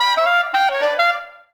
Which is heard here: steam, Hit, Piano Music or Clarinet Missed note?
Clarinet Missed note